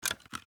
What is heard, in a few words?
mag hit; spasi15; tacz; daffas arsenal